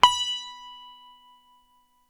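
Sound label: SITAR B 4 right, coral sitar, guitar; East West; Steve Stevens Guitar Samples Collection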